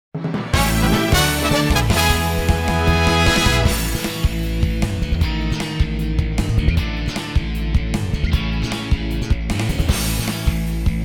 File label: Victory!; Lunar Silver Star Harmony